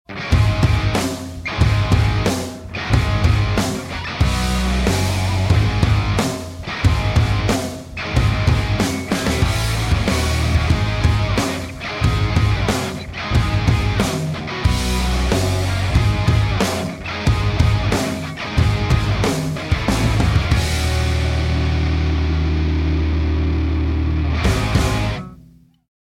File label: Instrumental; NFL FOX; PM USA